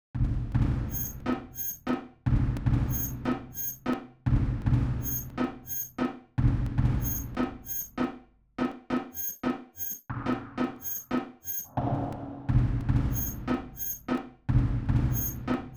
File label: Sinewave